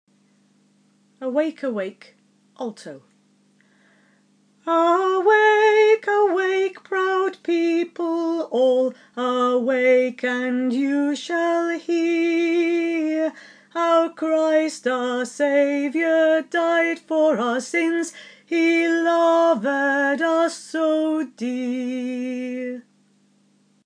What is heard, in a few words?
alto